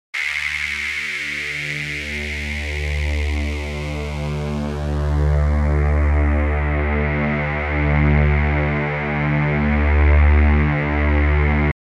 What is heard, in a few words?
filter env HP to LP; pro12